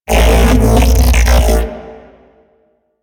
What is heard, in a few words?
Mech Ambient Vocal